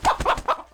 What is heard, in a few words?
hurt; CosmicRage; combat; creatures; chicken